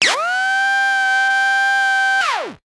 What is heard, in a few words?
rotary robotic buzz